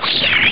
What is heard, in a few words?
monster noise; Amiga Soundtracker sample